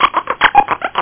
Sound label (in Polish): komputer; jurajski sen